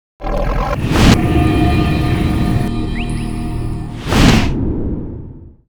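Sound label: Dragon Buff Skill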